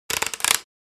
Gear turning ticking

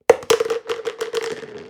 Plastic food container falling impact

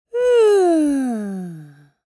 Young boy yawning

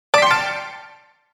Casino slot machine prize symbol

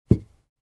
Desk or service bell place down on desk

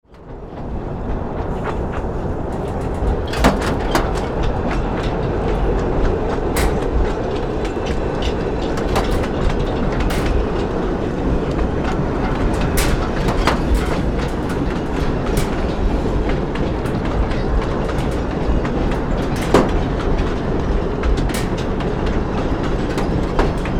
Heavy cargo train movement

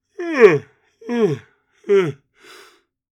Senior male multiple descending yawns

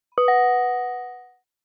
Elevator bell ring tone

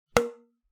Cartoon light impact

